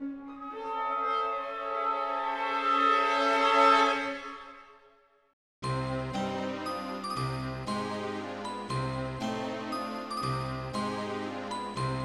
Unused Harpsichord Theme; CastleVania 64; LoD

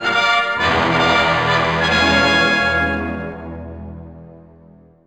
Level Start - Respawn; Star Wars - Shadows of the Empire [N64]

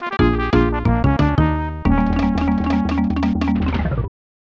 Lose Life (Jungle); DK Country 3

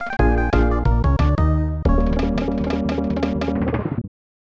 Lose Life (Waterfall); DK Country 3

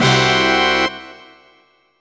Hit; Banjo Kazooie

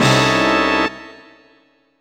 Hit; Banjo Kazooie

